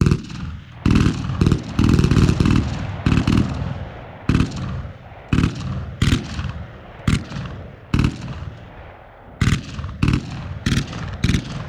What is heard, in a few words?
ambience gunfire